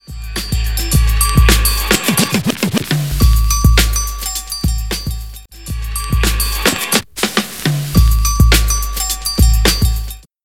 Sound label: Scratch & drum fill